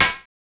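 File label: hi-hat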